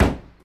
Street Ball Hit Car; SFX Kit

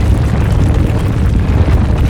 Quake Rumble Loop; SFX Kit; Destruction

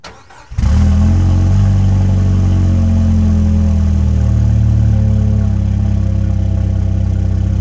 Jeep Wrangler Start Idle Loop; Trucks

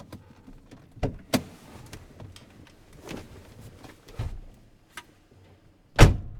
car getting out; best service Blue Box

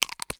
Medicine Bottle Close; Medical; Blastwave

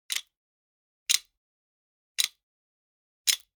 Generic Click Metal Tiny

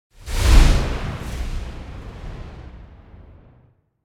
Whoosh Fat Storm; Hollywood Edge; sound designer's toolkit v2

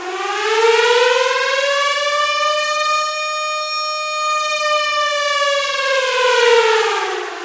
Emergency, Alarm, Siren, Air Raid, Synthesized; Blind Drive

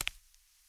Tile; blindside

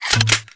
Gun Reload Weapon, Weapons Foley; SFX Kit

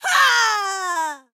voice attack female; Blind Gladiator

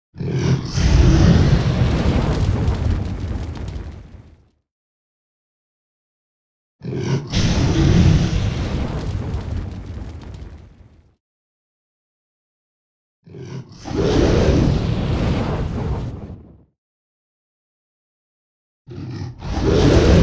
Dragon Roars And Breaths Fire; Sound Ideas; Series 2000

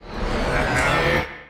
Punch Right Speed Up; Pitch Black - A Detective's Demise

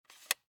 Viewmaster, plastic, lever, release; Eiravaein Works; Vaeyan IV

